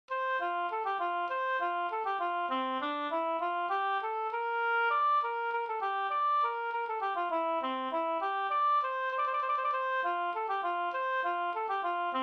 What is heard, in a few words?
polka polkett